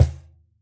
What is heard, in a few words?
Cajon hit f